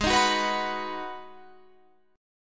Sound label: B 6th G sharp, guitar chord